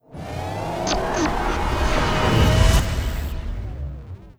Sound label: Warning